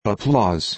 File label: applause